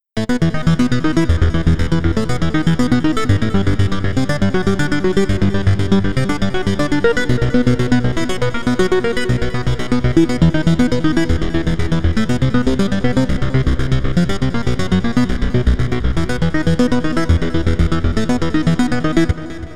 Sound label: tempo beat 120